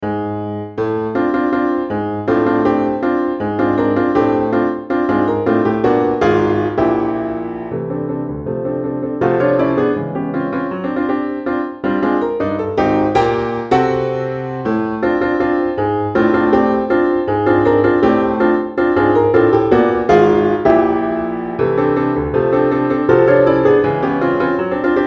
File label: piano sonata; mvt 3